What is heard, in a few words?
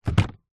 industrial engine oil large tub set down; zapsplat